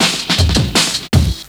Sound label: LOOP SD, Drum & Bass, 160 BPM